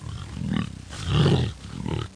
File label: GROWL; Total Chaos Nukem 3d Pack; duke